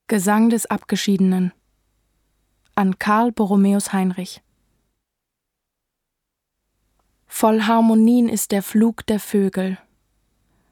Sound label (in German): gesang des abgeschiedenen